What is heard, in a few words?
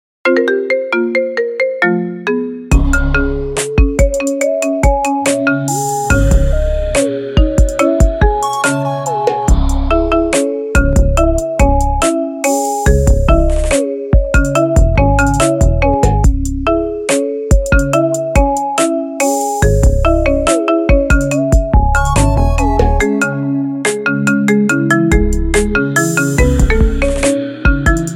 marimba iphone ringtone